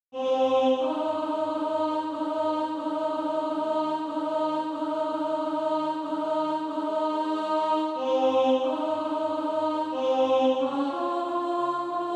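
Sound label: alto, SATB Practise Music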